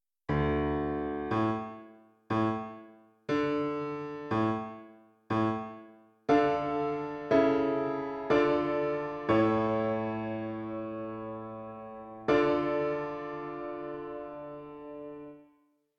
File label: Piano